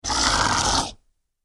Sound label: Gritty Beast Snarl, monster sound effect